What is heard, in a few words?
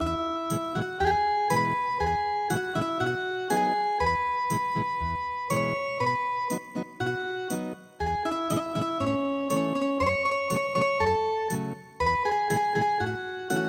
Ethnic Guitar